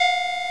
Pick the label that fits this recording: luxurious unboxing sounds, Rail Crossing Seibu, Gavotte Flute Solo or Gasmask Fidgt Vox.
Rail Crossing Seibu